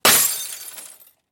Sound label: glass Break